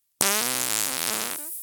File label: fart; vegan